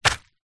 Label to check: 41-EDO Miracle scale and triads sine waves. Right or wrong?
Wrong